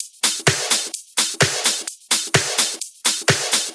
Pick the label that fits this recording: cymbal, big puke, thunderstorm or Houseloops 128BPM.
Houseloops 128BPM